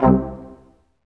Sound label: BRS Quintet stac; Roland L-CD702; Quint.Stacc